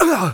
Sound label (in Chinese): 受伤